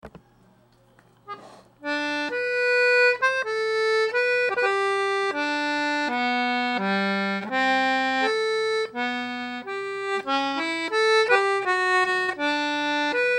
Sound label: meantone